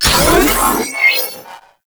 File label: activate console; Quests; Veilbreaker; Venus Kahl; Symbol